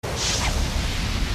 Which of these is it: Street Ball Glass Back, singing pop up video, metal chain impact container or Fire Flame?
Fire Flame